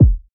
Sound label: tight lows trap bass drum sound F sharp key